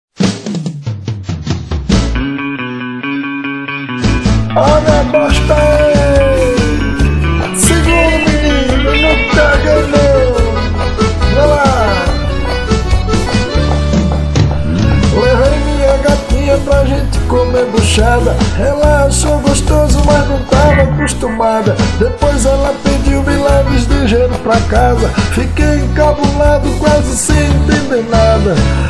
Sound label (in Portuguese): esse peido